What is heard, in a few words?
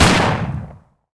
Shotgun Fire; Playable Tutorial Level